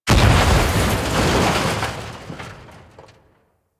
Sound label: Destruction Explosions Two; Ambience; Warframe; Corpus Railjack